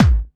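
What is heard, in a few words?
BD Trancy, bass drum; Takebeat; Club; VEC1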